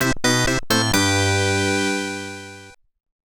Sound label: Scene Start; Captain Silver; Deco8; arcade